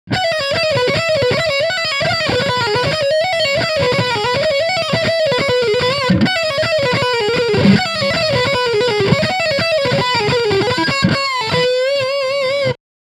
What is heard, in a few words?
Pentalick High Gain